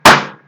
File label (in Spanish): Golpe